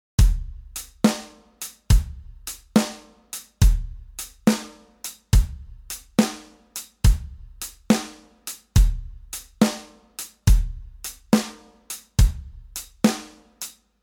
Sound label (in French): shuffle, batterie